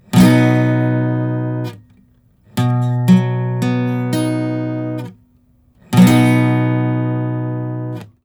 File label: Major or Minor